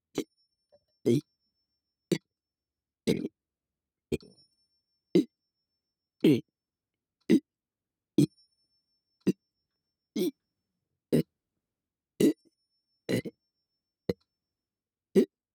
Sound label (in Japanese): 右耳 4拍子 「い」 の ゲップ メトロノーム; 月賦村レポート作成資料〜連続ゲップ真穂の練習〜